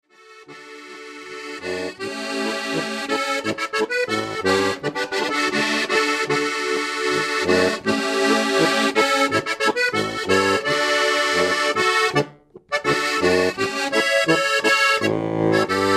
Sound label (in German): Steirische, harmonika; Hirtamadl